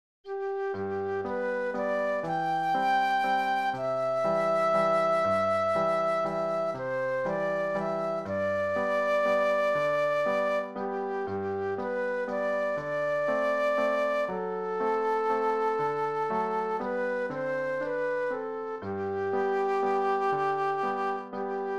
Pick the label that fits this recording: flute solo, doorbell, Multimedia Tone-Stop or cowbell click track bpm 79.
flute solo